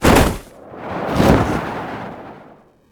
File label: breakdown